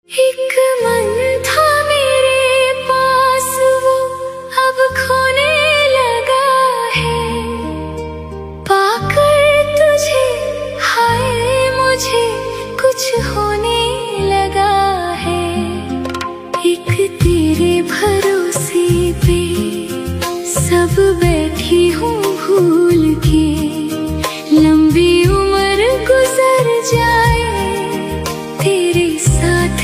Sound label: AI Female; Ringtone; AI Cover